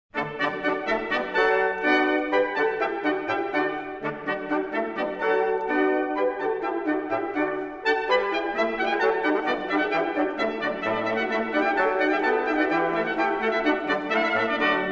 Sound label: Water Music Suite No. 2 in D major - Bourree; HANDEL, Georg Frideric; The Water Music Suites